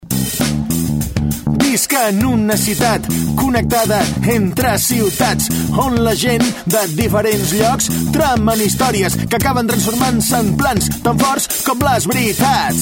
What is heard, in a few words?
jingle rap spot catalan